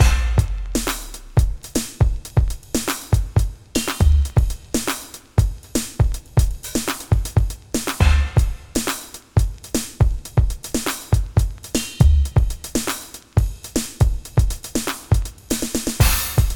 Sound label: Hard-hitting news